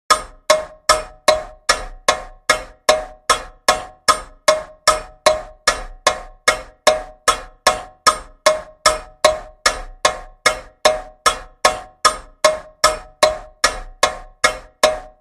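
Clock, Ticks; Czech Wall Clock, Dated 1823, Ticks Constant, Light Brass Mechanism; Clock 4, Bozak 1823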